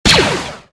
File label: fire, blaster; weapons